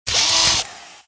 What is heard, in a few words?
Repair; Dwarves Smokebeard